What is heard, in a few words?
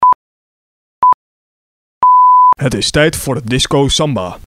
samba